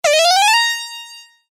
呪文を唱える モノラル; 魔法効果音

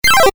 キャンセル レトロ

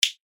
麻雀牌; ボードゲームやカジノ、麻雀の効果音です。

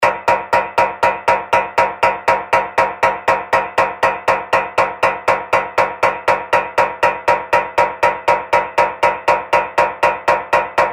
足音 金属 走る モノラル; 足音の効果音

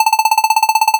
会話; nes retro talk sound effects

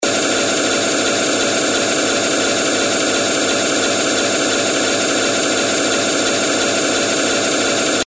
エレベーターが動く音 近未来 モノラル

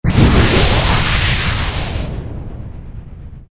風魔法 中 モノラル; 魔法効果音

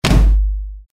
こん棒があたる; 武器効果音

